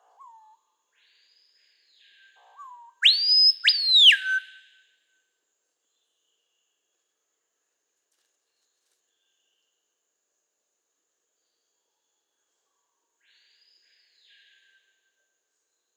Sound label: Lipaugus-vociferans, پرنده تاریکمرغ جارزن